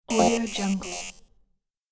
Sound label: wrong password